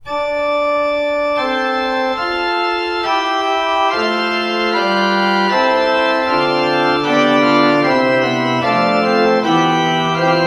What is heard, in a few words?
Mixtur, orgue